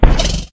zombie horse hurt; Minecraft

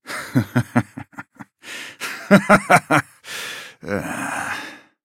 laugh, zh; Yasuo

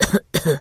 trader cough; 商人杰恩（七日杀）

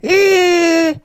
get hurt

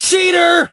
hurt

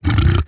hoglin idle; Minecraft; 疣猪兽（我的世界）

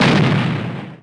cannon

